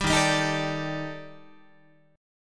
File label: D sharp Major Sharp 11th D sharp Guitar, chord; strummed; 10,11,10,13,13,13; 4A,4E,5A,5D,5G#,6C#; JGuitar